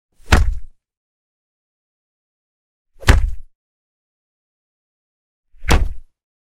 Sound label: Kick (Deep), Martial Arts and Human Impacts; Hollywood Edge Martial Arts and Human Impacts